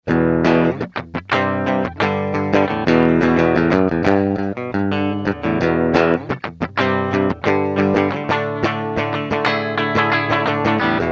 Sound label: clean stock mod; circuit demos; factory